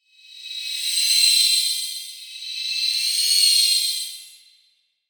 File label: Shimmer Swells Ascend Descend, Metallic High; Blastwave